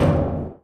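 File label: panels impact small, metal; Portal 2; Source Engine; video games; physics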